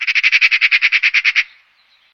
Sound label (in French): pie bavarde